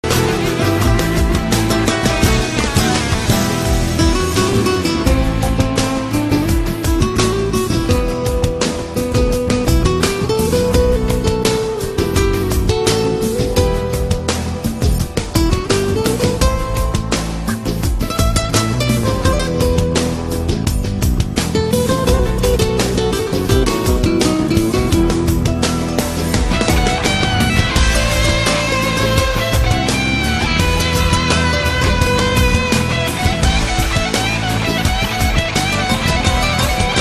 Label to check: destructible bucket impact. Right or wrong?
Wrong